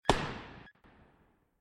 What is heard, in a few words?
Rocket Explode; Secrets of Magic: The Book of Spells